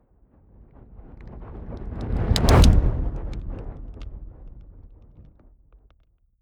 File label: fire whoosh crackling long